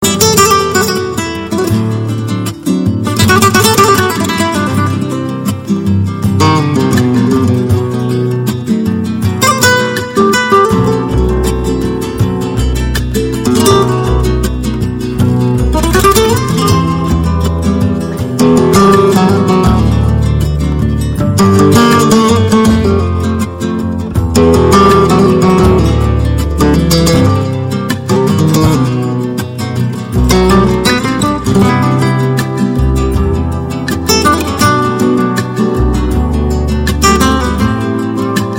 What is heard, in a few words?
Melody, RingTune